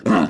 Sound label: tha odium damage